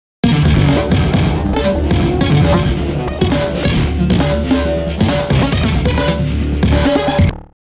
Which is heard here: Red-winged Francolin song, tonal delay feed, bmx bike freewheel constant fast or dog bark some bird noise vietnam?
tonal delay feed